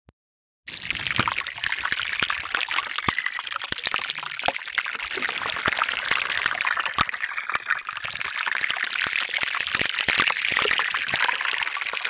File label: Ice Melting in Fjord; Deane